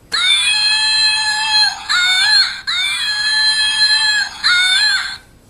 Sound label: monkey